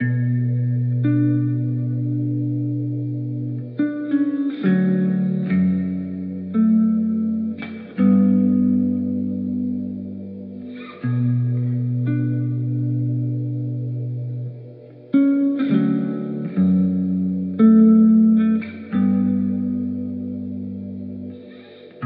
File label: 174 B Melodic